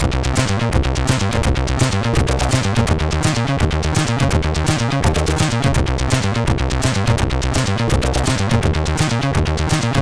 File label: Intro Tune, sid MOS6581R3; HCL; hvsc